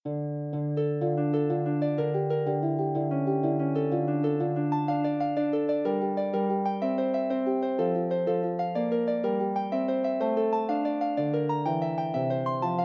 prelude, Harp

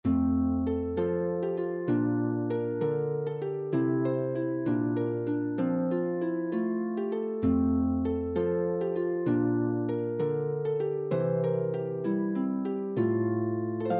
Jig, harp

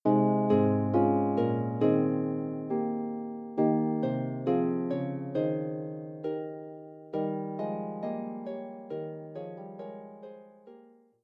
Harp

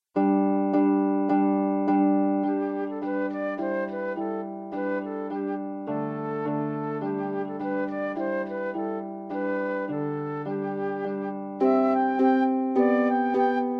celtic dance; Miniatures 2

